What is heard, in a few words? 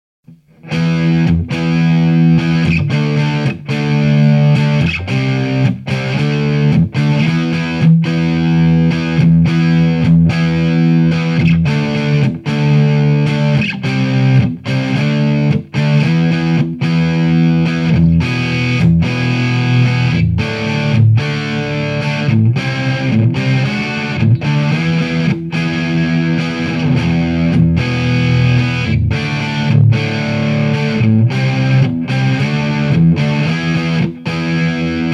Mesa Clean + Marshall G6; DigiTech und Marshall Vergleich